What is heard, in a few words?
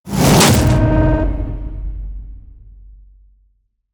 Quest Failed; Custom UI Sounds; Call of Duty Advanced Warfare